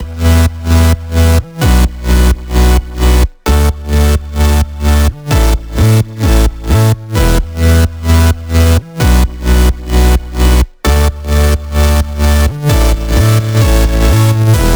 130BPM Melody; Vengeance Samples; VFH1 130BPM Tibet Kit

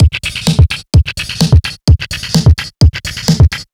House Beat, 128 BPM; Vengeance Samples; VEH1 Loops

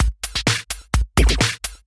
Electro Loop 128 BPM; Vengeance Samples

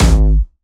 Jumpstyle Kick G#1; Bassdrums; Jumpstyle Multis; vengeance essential club sounds-3; VEC3 Bassdrums

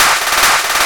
Fill 140 BPM

